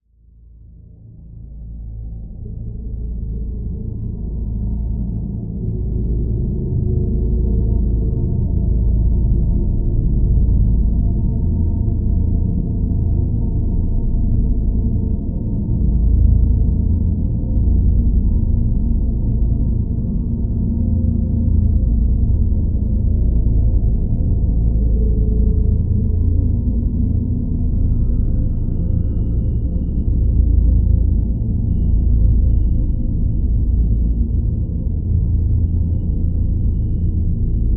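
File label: Drone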